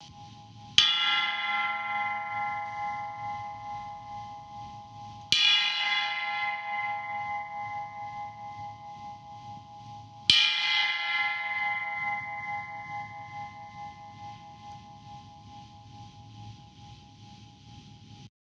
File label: alien waves